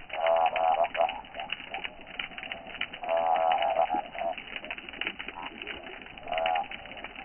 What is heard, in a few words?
Unknown sp Kwa